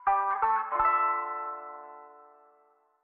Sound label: Rhythm Village Intro Stingers; Mountain SFX